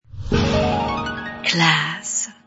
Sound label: jingle; clazz